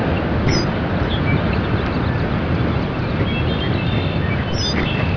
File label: 1 chirp